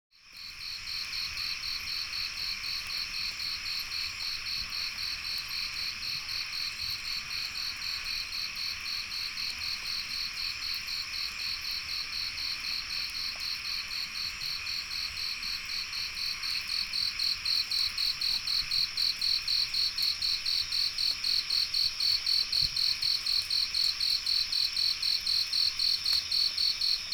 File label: Insects Frogs of Sen Monorom, Cambodia; Les Cartes Postales Sonores; NOISE ON EARTH Vol1